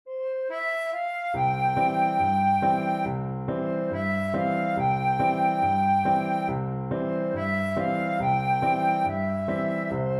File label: when the saints, flute